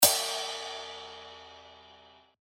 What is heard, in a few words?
Ride, cymbals; revealed cymbals vol 1